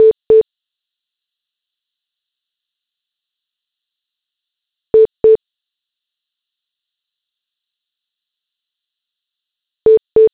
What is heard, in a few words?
hold dual tone